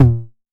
TOMS 909; Wizoo - Platinum 24 Electronic; CHR TOMS 909

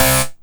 Synth Hit C

